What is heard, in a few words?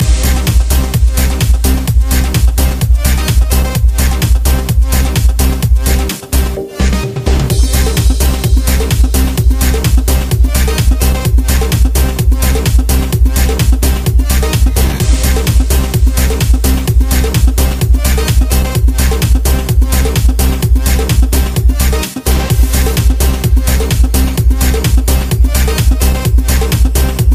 dance